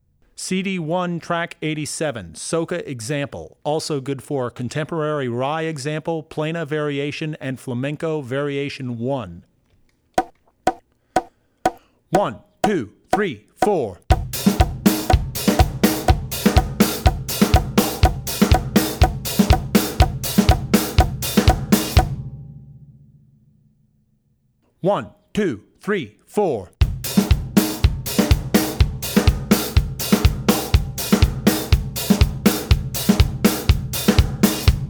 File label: Soca; Drummer's Bible Tracks